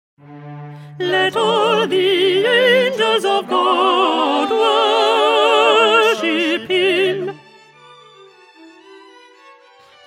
Alto; Handel; Messiah

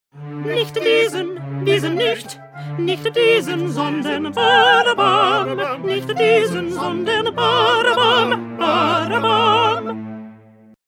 Alto

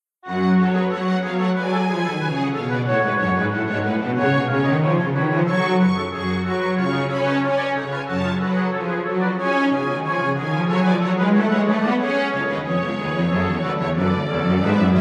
Magnificat Instrumental; Bach

